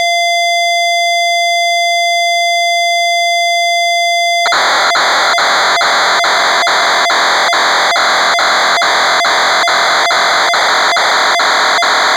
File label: UFO